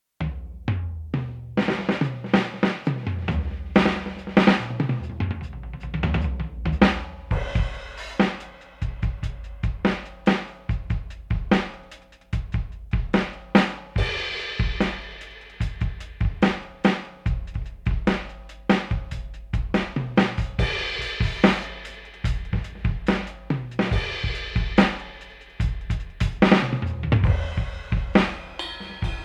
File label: YAMAHA DTX10K M Radio Dark